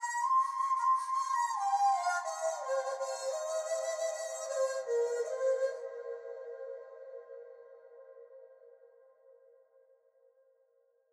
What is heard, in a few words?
Shimmering Sky; Ethnic; Spaces2; Seventh Heaven Professional